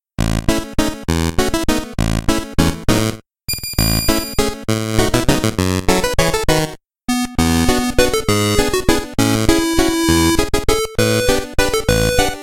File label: Music Dance; Shovel Knight King of Cards